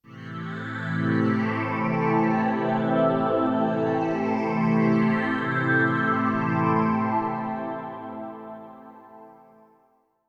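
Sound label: synth electro 140 A; Techno; Keys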